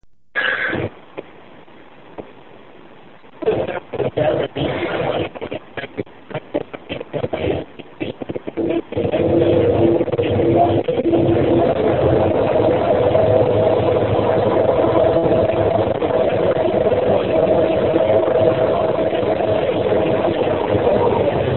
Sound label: Indust 95db; JabraBT2020; Mogul